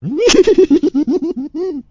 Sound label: Pig Laugh; Angry Birds Space